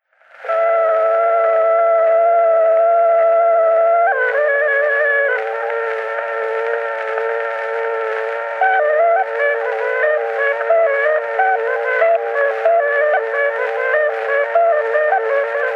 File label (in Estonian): Torupilli Jussi lugu, Pill ütleb, pidu lõppeb, Emmaste, torupill, folk; Juhan Maaker